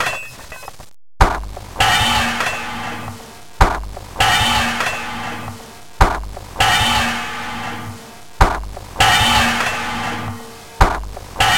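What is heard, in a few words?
various distorted percussion sounds mixed with drums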